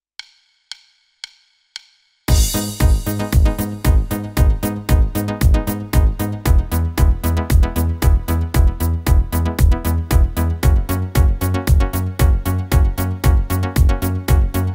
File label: italo disco style korg, Korg Pa5x; New Italo Disco; Musikant